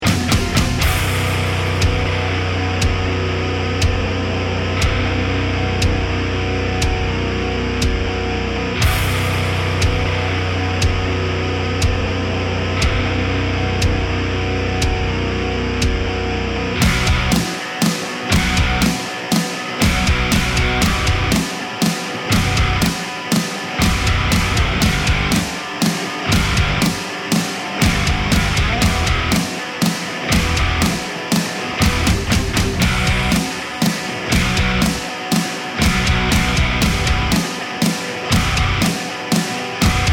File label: bomb planted